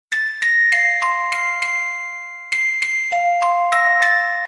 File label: Softest Sms